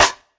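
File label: classic hip hop snare; STYLE KITS; Classic Hip Hop Mega Kit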